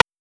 Rim

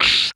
splash beatbox

